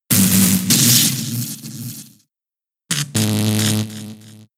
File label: Thunder Wave; Pokemon; gen 6 attack moves; XY ORAS